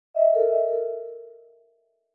Sfx Golf

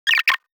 Player Idle; Ordia: One Finger Platformer; Android, iOS

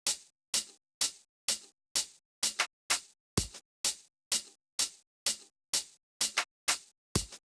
hihat; 16beats

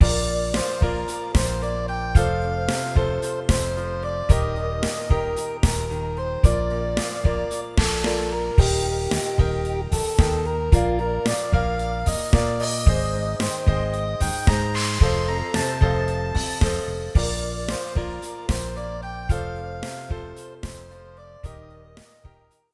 Loop; the king of fighters 99 millennium battle; neo geo cd

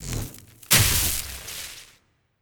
Valkyrie Attack

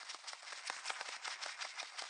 Mantis Heavy Flyer Fly Loop

